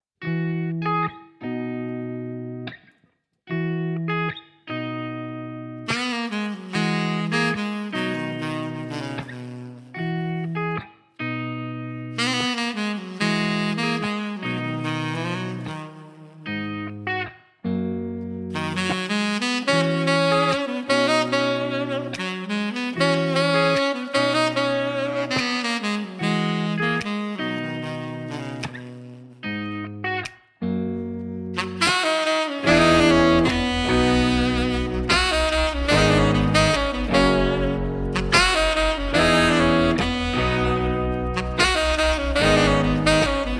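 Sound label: sax, Contemporary & Jazz Saxophonist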